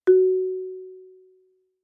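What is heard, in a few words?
Marimba yarn fortissimo Gb2; MIS Pitches - 2014; Percussion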